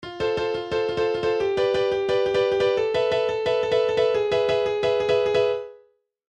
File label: piano